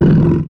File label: CANNIBAL DAMAGE